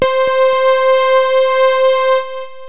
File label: syn, loops synth